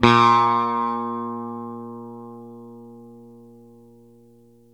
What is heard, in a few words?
SITAR A#1, CORAL SITAR; East West; Steve Stevens Guitar Samples Collection